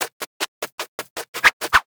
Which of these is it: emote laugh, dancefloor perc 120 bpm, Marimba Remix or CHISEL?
emote laugh